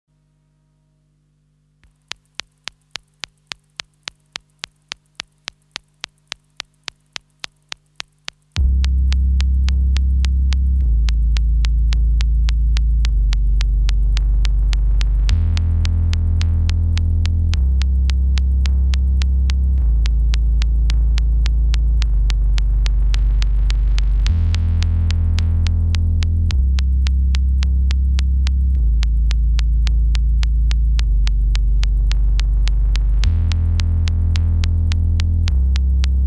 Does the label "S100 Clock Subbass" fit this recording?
Yes